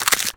slide; cookie run

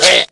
jump; Doctor Bone Cookie; cookierunwiki

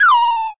slide; cookie run

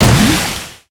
Obstacle Soda; cookie run

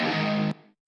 skill guitar

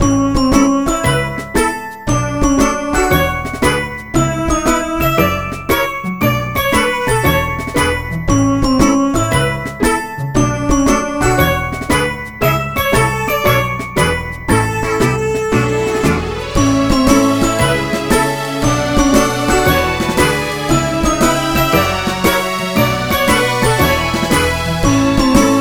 Bgm lobby; Cookie Run; cookieland

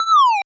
slide; cookie run

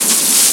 chain; cookierunwiki

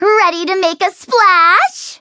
menu tap; melon soda

